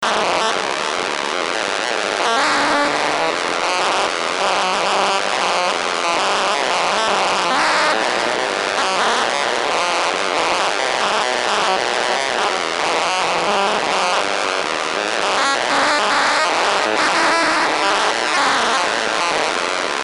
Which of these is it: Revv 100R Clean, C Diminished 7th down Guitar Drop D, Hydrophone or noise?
noise